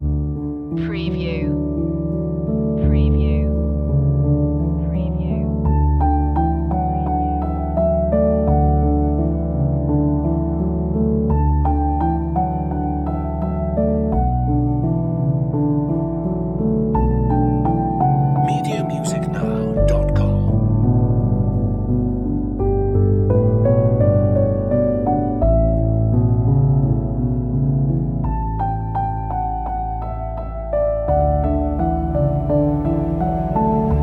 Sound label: loop; romantic nostalgic